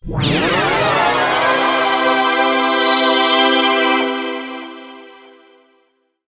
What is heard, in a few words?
fire